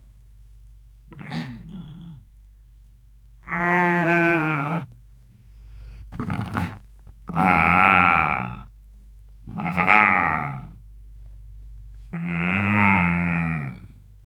man groaning like a horse; vocalizations

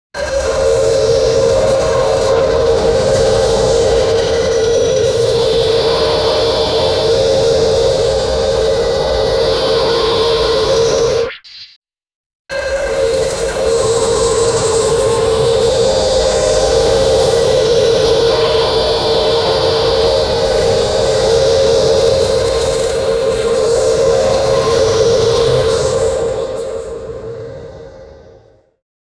mechanical cyber rock gol, cybernetic

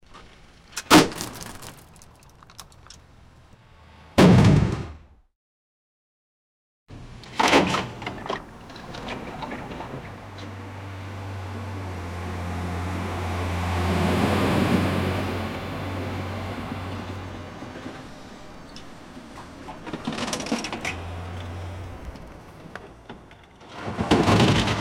the sound of the opening, dumping

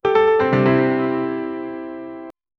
piano jingle

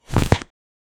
sudden hollow skull roar, teeth snap

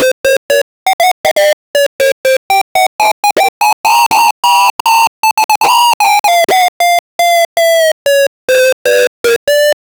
create a sound effect of, sport

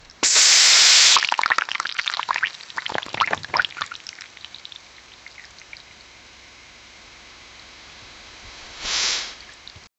a sharp hiss as soda, bubbling

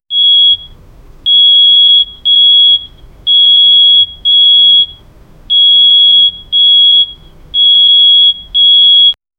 the sound of the alarm, dream

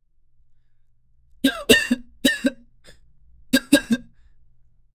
intense painful coughing; medical

